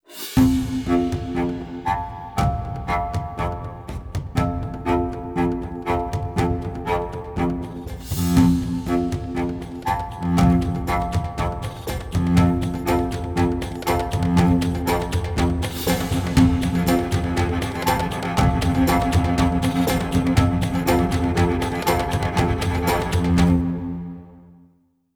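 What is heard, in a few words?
Music Tension; Curse of Atlantis: Thorgal's Quest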